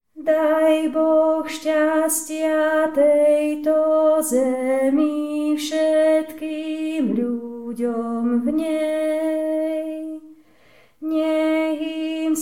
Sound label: bass; dajbohstastia